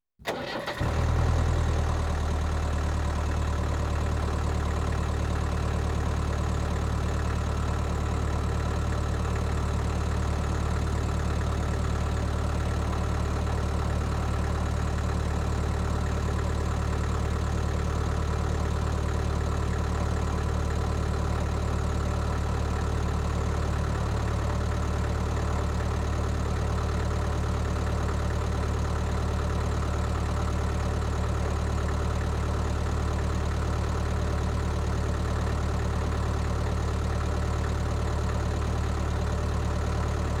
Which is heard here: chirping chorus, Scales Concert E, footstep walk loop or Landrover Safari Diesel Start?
Landrover Safari Diesel Start